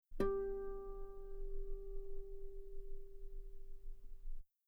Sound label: guitar G4 very long forte harmonics; bananenkeyboard